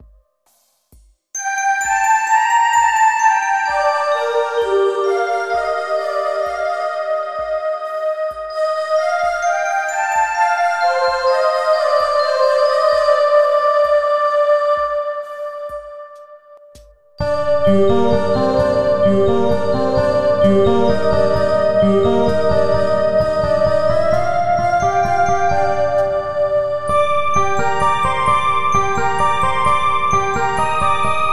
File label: keyboard wedding of love